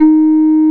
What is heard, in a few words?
OB8 blow EB3, OBERHEIM OB8; Big Fish Audio; First Call Vintage Keyboards